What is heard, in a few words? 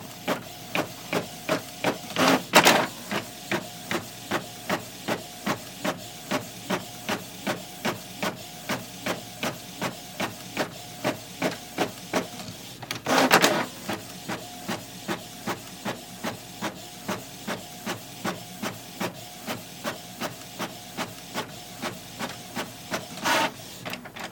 continuous print; electric